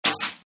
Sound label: Arrow released